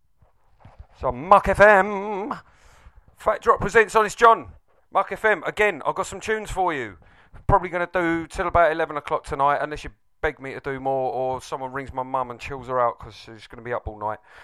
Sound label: Jungle